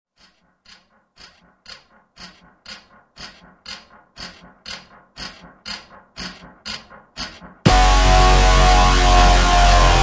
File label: Trailer Music Terror